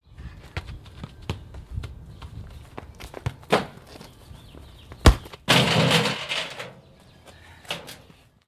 Street soccer match

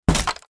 Karate chop wood breaking

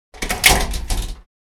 Service elevator door slide and latch

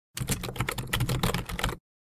Computer typing on office keyboard fast pace

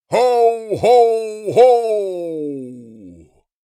Santa claus jolly laugh; christmas

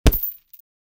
Boxing glove hitting punching bag

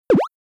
Cartoon designed boing

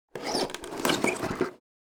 Wood squeaks

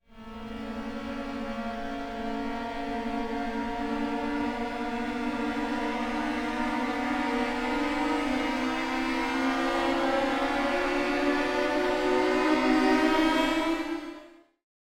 Cartoon suspense, Cartoon Orchestral

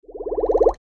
Bar chart expand animation infographics

